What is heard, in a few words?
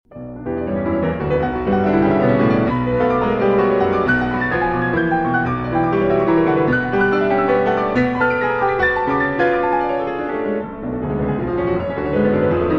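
Variations on a Polish Folk Theme, Piano Works; Szymanowski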